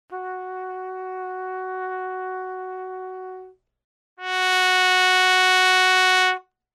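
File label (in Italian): Piano Forte, trumpet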